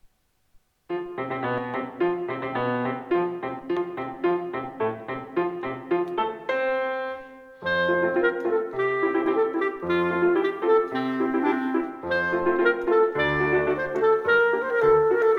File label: marche militaire, Skilful Solos